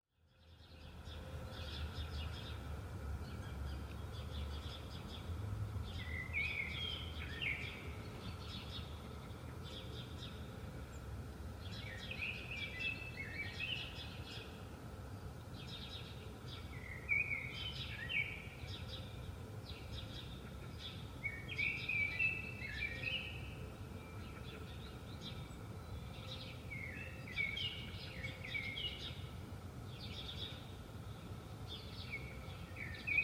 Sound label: birds